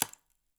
Palette Pulse, Wooden Palette

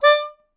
Melodica Short; Short Notes Chromatic

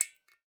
Drips

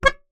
Melodica Short Note

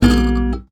Classical Guitar Extended Technique

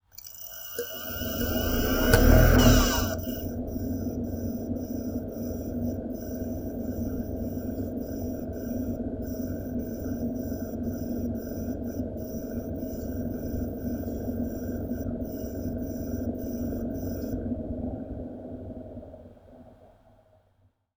Spin Up, DVD Drive Internal

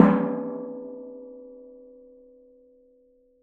Strike, Washing Machine Drum Outside

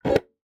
Single Shunt, DVD Drive External

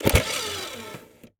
Eject, DVD Drive Internal

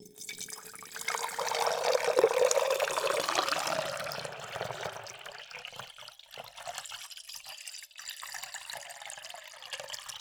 Water Pour, Vase

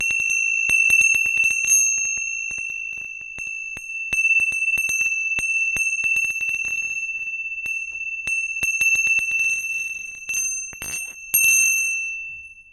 Tibetan Texture, Tibetan Cymbals; Percussion Samples

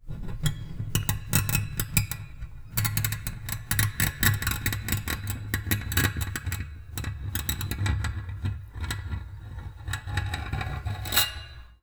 String Scrape, Piano; Piano Miscellaneous

